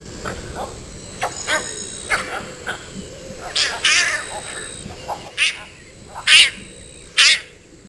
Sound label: Cattle Egret